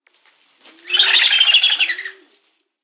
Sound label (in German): Moenchsgeier Aegypius monachus